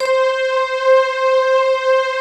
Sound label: VIBRA STRNGS, pads; Pro-Rec - Synthsonic